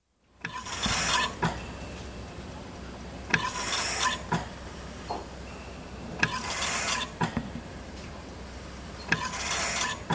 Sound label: Ventilator (Recorded at machine end); Hospital Sound Effects; BBC